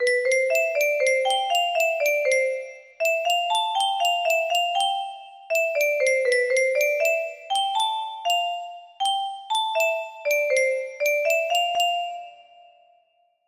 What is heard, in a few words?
music box